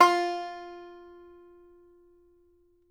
Banjo F4 L; Sampleheads; Whole Lotta Country 1 & 2

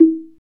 SLIT DR, AFRICAN PERC; Roland LA

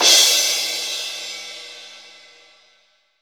18 crash, Crash Cymbal, mono crash

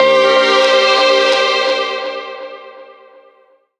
RAW STRING FX, Gbm; Rawcutz - Nu Forms

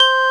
organ, M1 organ; Best Service ProSamples vol.10; House; AKAI

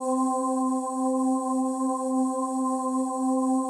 PAD, DIGI-PAD

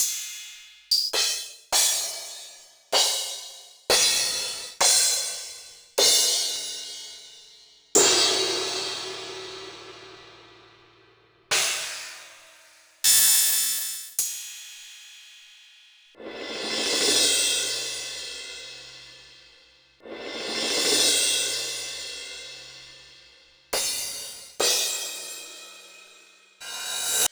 Akai XR-20 Cymbals

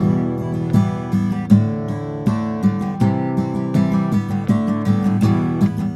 EM GTR, Celtic Flavours; USB Soundscan vol.42; AKAI

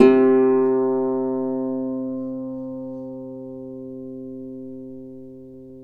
Celtic Harp, Plucked Strings

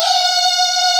BULGR C6, BULGAR VOX; East West; Global Sounds

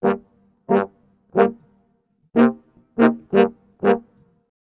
90 g min brasses; PowerFX - Downtown Orchestra; Orchestral arrangements

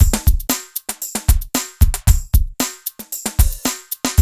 slam jam 115

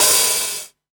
DDD-1 Hat Open, drum kit; Polyend; Stazma & Concrete Collage; Concrete-DDD1

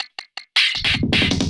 Loop, Drum & Bass, 160 BPM

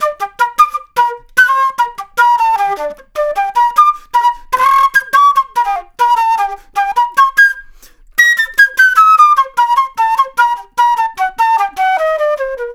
Flute Solo, Jazz; Flute Loops; Sonic Foundry (Sony Creative Software)

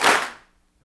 CLAPS, Africa Handclaps

